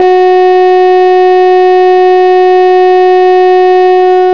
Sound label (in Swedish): stort larm ringtone